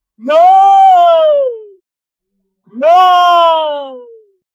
cheer of someone, cheering

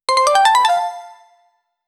create success sound when

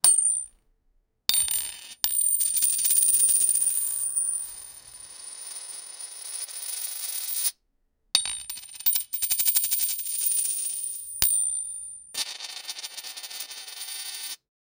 sound of lots of coin; finance

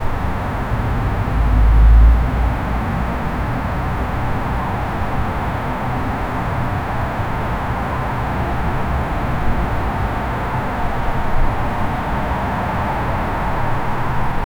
distant city hum faint

